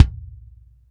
BD, Bassdrums, Roland TD-20

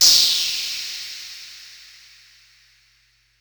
Whoosh, sci-fi

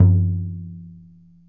Frame, World Percussion, One Shots; Sony CS

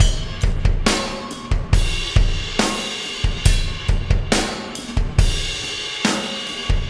Impact Crater Beat, Processed Drumkits

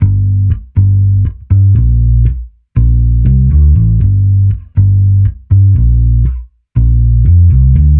Bass Guitar 120BPM Gm; Instrument Loops; 99Sounds; Monosounds

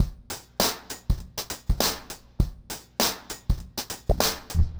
Remixed Drums 100BPM; The Roots Drumloops

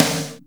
Snare, Akai MPC-500 Drum Machines; Toolkits; Snare TKit

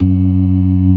CLASSIC C3, Synth and Organ; InVision Interactive; Keith Emerson; The Most Dangerous Synth and Organ

